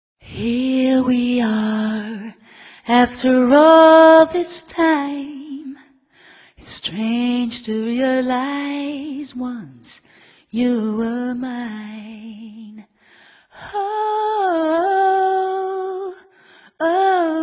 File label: vocals